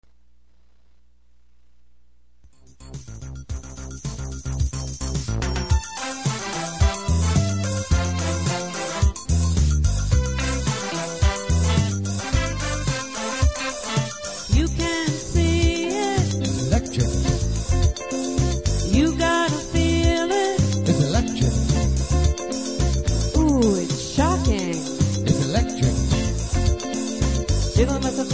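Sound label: Oldies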